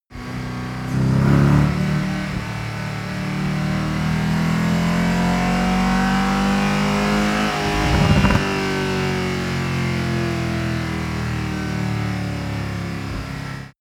car mercedes sls amg short acceleration

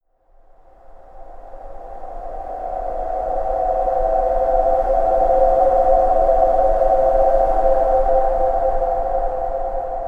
windy, music elements; flaechen

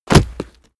body fall concrete leather rumble; nahkampf; soundpool

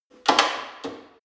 gym bicycle saddle adjust lock hard; sport freizeit; fitnessstudio

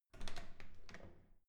security door open soft

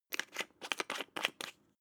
glass pepper cap screw down; soundpool

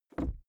briefcase plastic creaky inside pocket; industrie wirtschaft; bueroarbeit

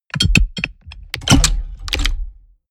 mechanics large lock

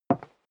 step leather shoes wood parquet single left; schritte

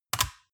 metal device click lock in; industrie-wirtschaft

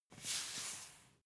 salt tilt

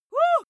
female cheer wohoo; schreie; menschen

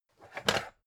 pan take hard

